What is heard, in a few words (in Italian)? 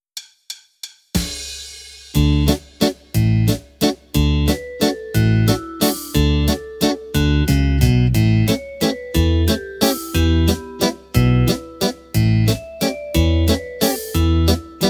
base, valzer